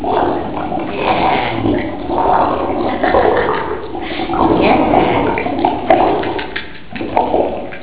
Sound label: witch